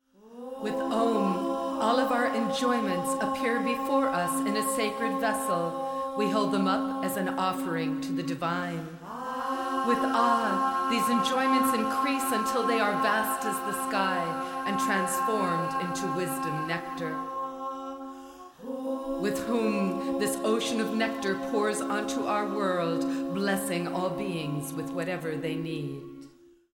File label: Om Ah Hung